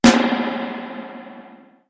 springverb

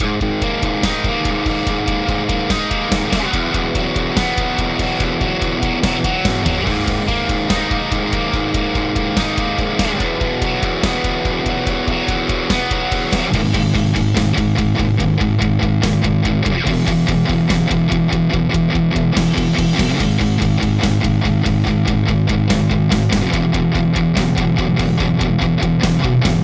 TubeSlammer

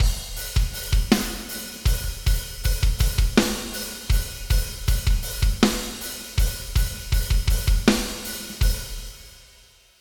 drums wet; mid side

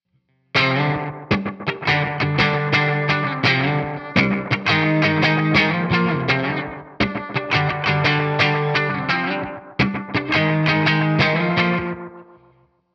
GTR delay insert

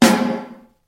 room verb

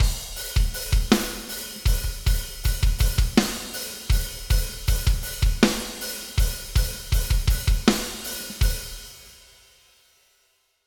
drums wet MS; mid side

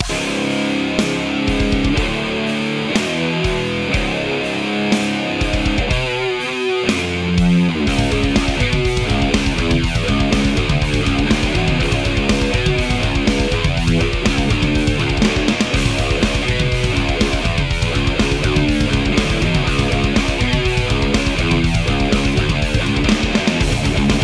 guitar chorus; doubletrack gtrs

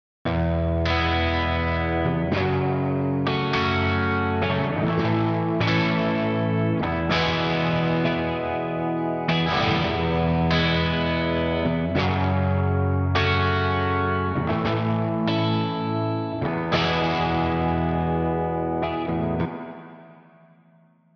guitars xcomp; multiband

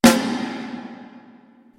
plate reverb

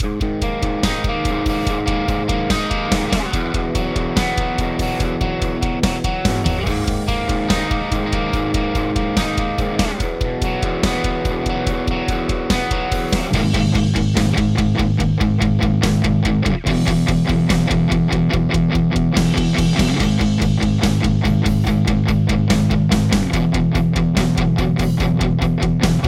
TubeSlammer 50 drive 50 tone 50 level; ts shootout

